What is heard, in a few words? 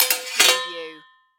Sword Impact Shield